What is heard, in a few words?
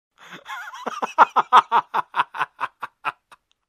Man Laughing